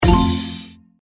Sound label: hit; CandyStorm